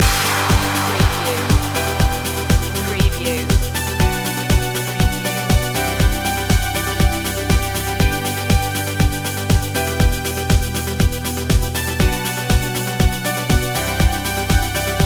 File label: rise above loop